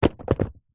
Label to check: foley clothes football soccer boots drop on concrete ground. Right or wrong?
Right